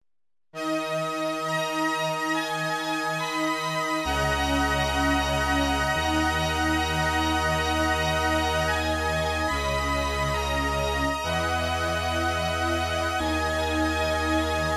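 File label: synthesizer divine accent slow tempo organ sound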